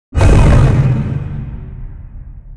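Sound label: pile distant, construction; ambient; pile samples; Half Life Alyx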